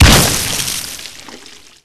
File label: Weapons Bio Rifle